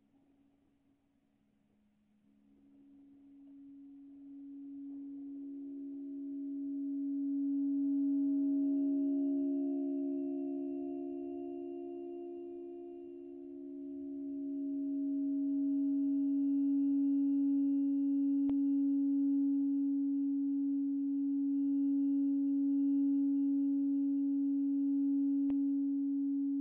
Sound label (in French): medium vert, aeolian; corde médium en polyester tressé - braided polyester medium string; Expérimentations diverses et en cours. Current various experiments